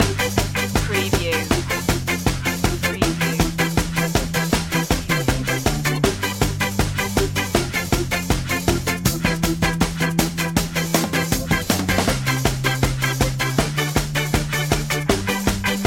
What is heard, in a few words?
happy ska